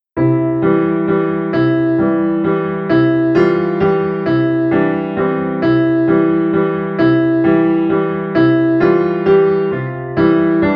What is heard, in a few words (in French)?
Valse; Ketab 1